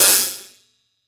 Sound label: Grunge Hat; Drum Hits; Nu Dance Electro